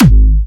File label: Springer G#4, Big Room Kicks